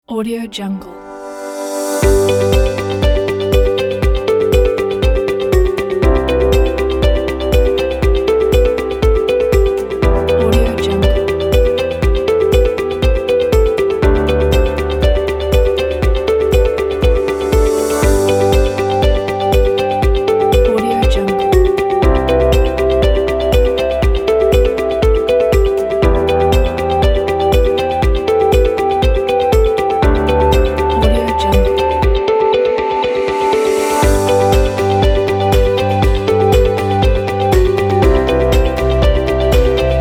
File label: uplifting background corporate